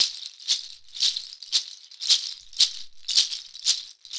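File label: shaker, Synclavier Percussion, World & Orchestral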